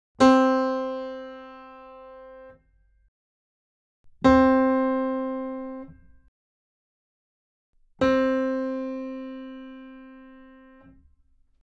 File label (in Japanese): 打弦, 弦鳴楽器; Forte